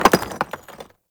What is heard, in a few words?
Vendor Clay Shuffle Texture; Ambience; Soul Plane